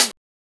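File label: HH, hi-hat; X-Static Goldmine 1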